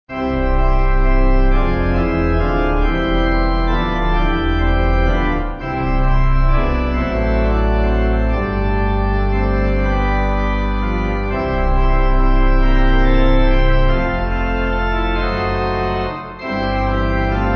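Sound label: Pipe, hymn backing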